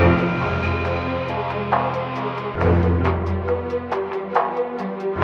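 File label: strings ominous, Sad Loops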